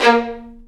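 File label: Violin Spiccato Bb2; Digital Sound Factory Virtuoso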